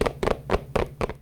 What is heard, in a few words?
run; library of ruina